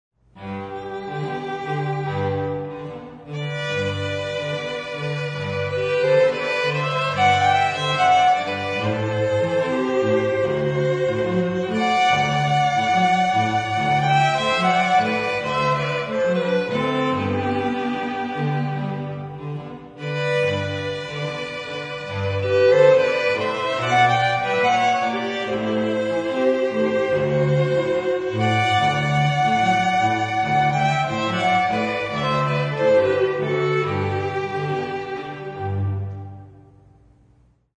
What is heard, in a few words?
rec trio